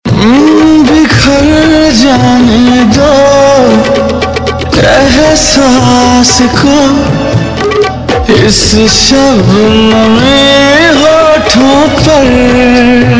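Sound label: Ringtone, Bollywood Punjabi Pop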